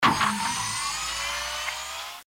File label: door opening; Code7